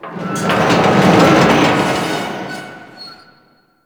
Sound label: Metal Subway Car Heavy Creak; Warframe; Ambience; NineteenNinetyNine